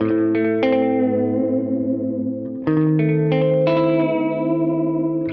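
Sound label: Gretsch Guitar, 90bpm, A; Loops n Lines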